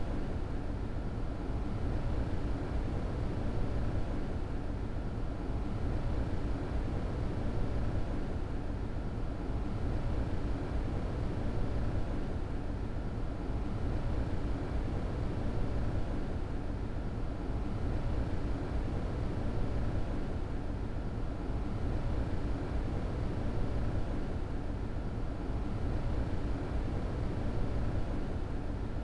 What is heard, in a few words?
airconditioner slowed